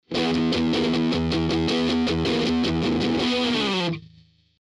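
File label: HEAVY CHORD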